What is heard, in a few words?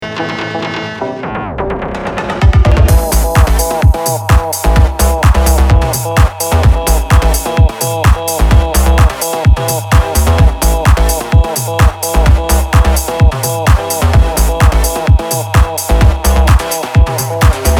Buzz Saw